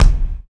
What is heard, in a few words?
Body Perc; Spectrasonics; Supreme Beats; World Dance